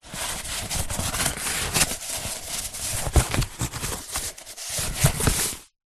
foley cardboard flaps pieces handling; zapsplat

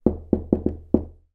household door knocks x5 internal wooden

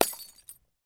impact glass bottle smashing

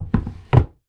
household wardrobe drawer old heavy close; zapsplat

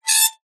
foley metal scrape squeak small

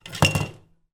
household kitchen knife drop into holder

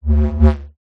science fiction light sabre swing electronic hum

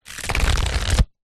household puzzle book thin pages flick through

